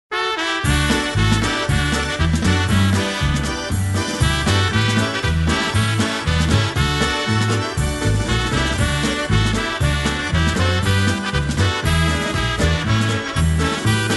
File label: Polka